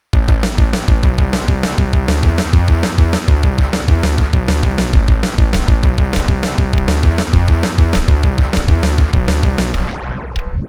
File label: Drum and Bass